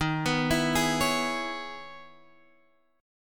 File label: Db Minor 7th Eb